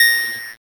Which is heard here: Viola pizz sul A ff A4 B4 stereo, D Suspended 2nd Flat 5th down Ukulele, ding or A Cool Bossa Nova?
ding